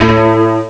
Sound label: great bass; ST-XX Amiga Soundtracker Sample Packs